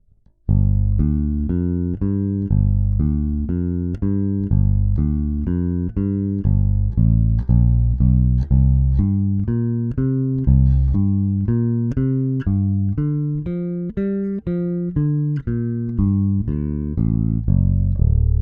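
AER AMP One Two Three High Mid cut, acoustic guitar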